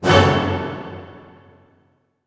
F# MIN FF, orchestra hits; Sound On Sound - Peter Siedleczek's Orchestra

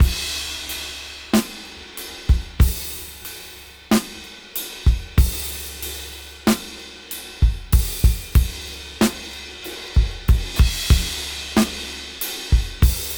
Half Time Slow 94 BPM

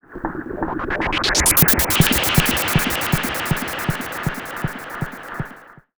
SH-09 Delay

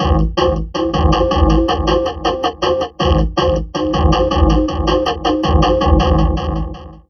Drum Loops Crazy Processed

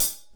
hihat, Drums, Kurzweil K2000

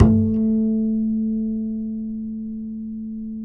A 3, Bass; Spectrasonics; Bass Legends

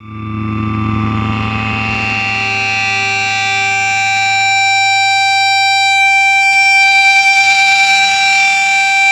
Feedback, Industrial; Nasty

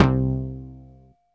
SYN JX; Best Service Gigapack 1

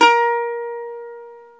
SAZ, Plucks; Eth Plucks, Pluck Duo

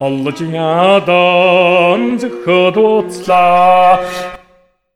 MONGOL V, Asian Traditions

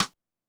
Rim, Drum Kit; Concrete Collage Drum Kit; Concrete-999

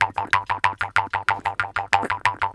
KHOMUS; USB Soundscan vol.60 - Asian Traditions; AKAI

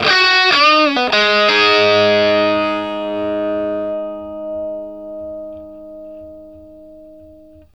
Vintage Blues Guitar, 60, GS; USB Soundscan vol.22; AKAI

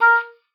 A#4, OBOE; Xsample VOL-6

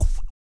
BOOGAROLL, Vocal Blues Soul; Spectrasonics Vocal Planet

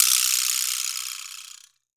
Vibraslap, Perc; Akai MPC500

